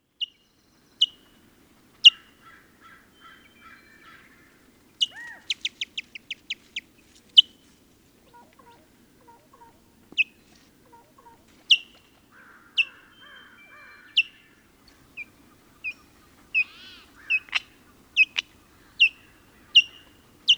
White-tailed Kite

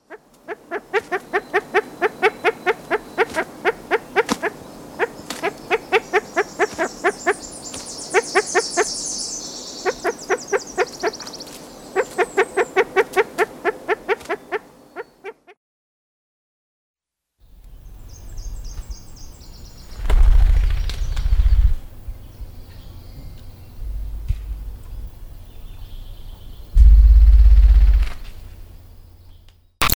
Spruce Grouse

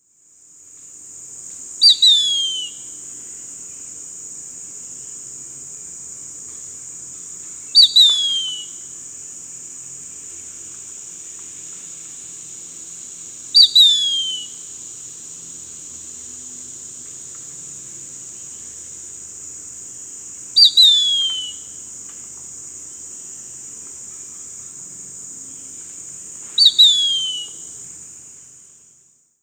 Mississippi Kite

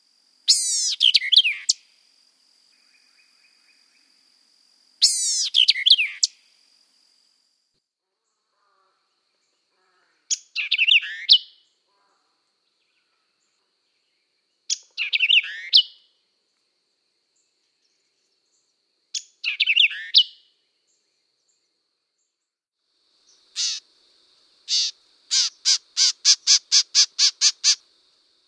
White-eyed Vireo

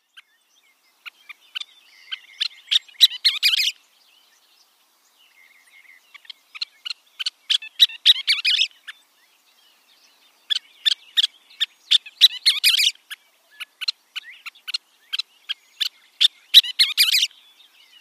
Scissor-tailed Flycatcher

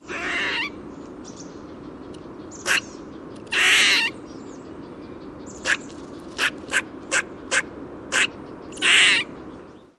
Eastern Gray Squirrel; Telinga

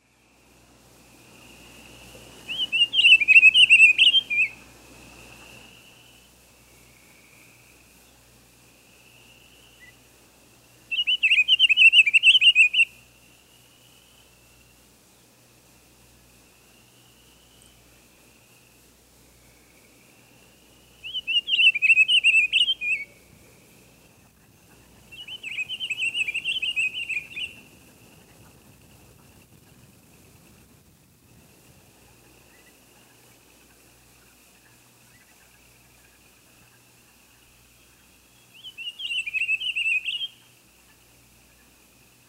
Pine Grosbeak

